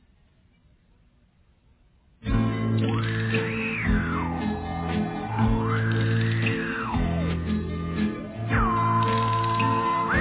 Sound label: Instrumental